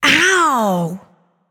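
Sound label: ouch, female voice; smite; voicepack; hera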